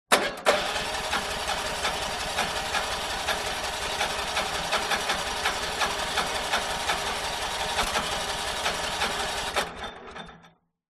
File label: woodchipper petrol motor engine false start; industrial